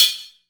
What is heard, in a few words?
Metal